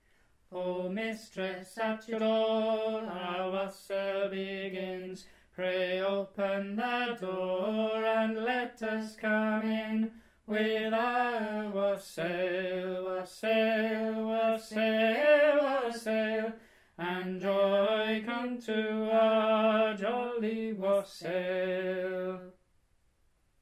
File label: Wassail tenor